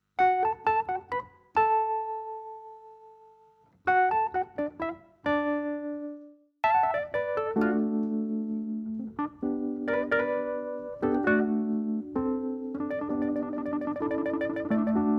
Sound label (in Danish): keyboard